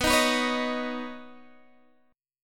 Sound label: B Major Add 9th, Guitar DADGAD chord; Badd9; strummed; x,14,13,16,16,13